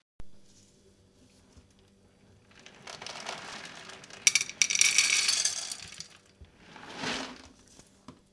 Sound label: Pouring Dog Food